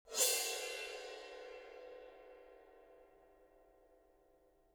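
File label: suspended cymbal rub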